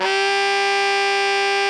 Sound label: ALTO GR G 3, Saxophones; Greytsounds; Philip Wolfe David Crozier's Saxophones